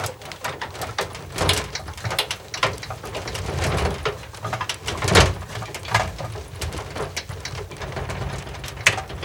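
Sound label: metal sheet rattle wind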